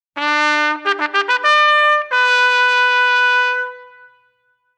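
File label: Movie Call, bugle call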